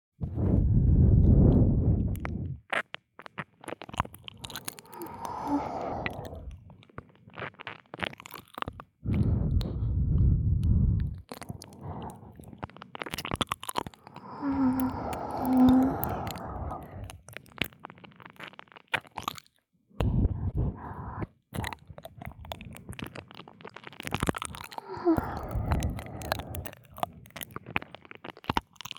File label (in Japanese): 右耳レロレロ舐め, ASMR